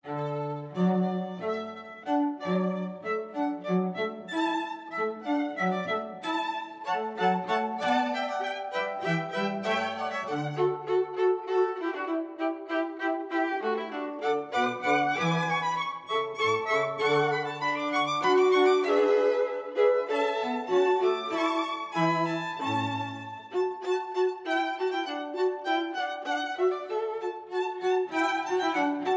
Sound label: Hornpipe String Quartet; Handel